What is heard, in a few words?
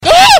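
squeal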